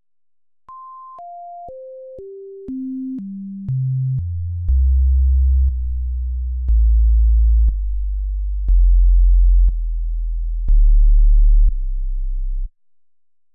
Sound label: Deep Tone Project